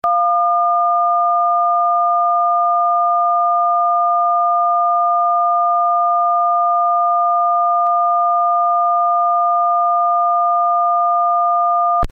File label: tone